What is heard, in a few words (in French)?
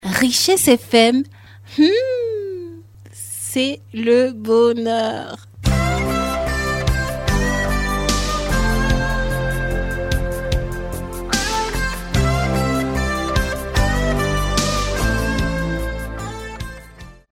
JINGLE; Richess FM